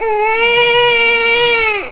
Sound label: cry Sound